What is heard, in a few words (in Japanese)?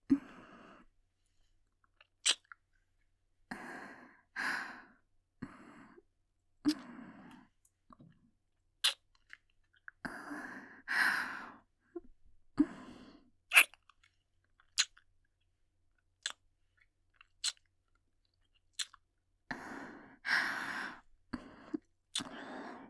ひたすらキス, ASMR